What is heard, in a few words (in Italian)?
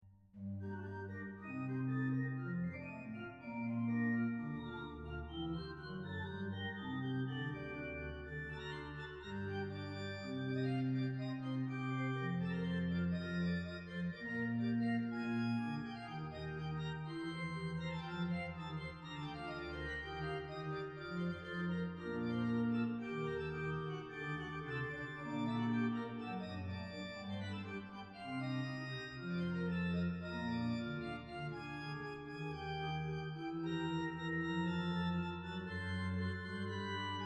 Klein mixture baroque style, organo virtuale; sonus paradisi; Litomysl